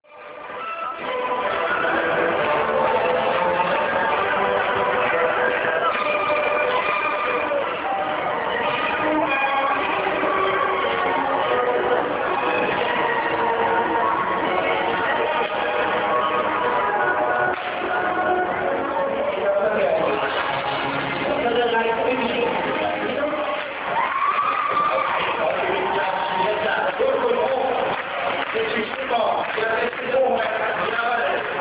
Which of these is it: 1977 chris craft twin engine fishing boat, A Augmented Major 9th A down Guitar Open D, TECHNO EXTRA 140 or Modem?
Modem